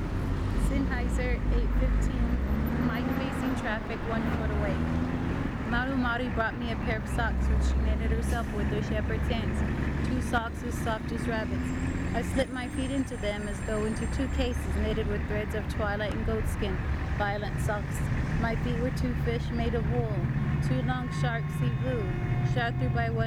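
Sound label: Senn 815 1ft Mic Facing Traffic, Super Cardiod, Exterior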